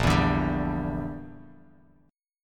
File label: Bb 6th Flat 5th Bb, Bass Standard; Bb6b5 strummed